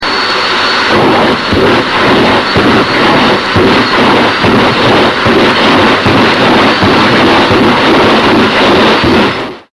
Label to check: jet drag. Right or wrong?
Right